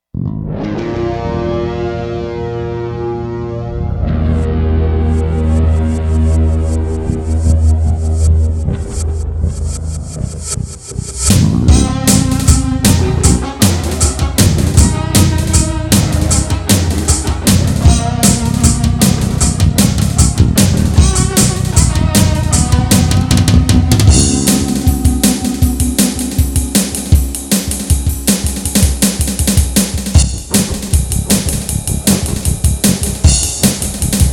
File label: Noise Picker